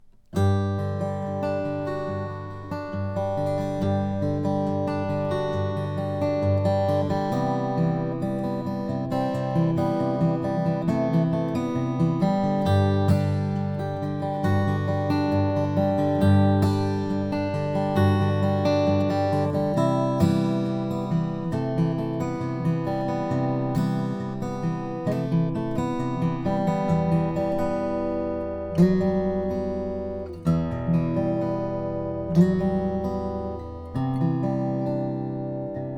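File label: walnut acoustic guitar; langejans custom w 6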